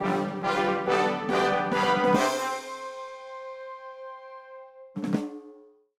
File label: Intruder Alert Show Jingle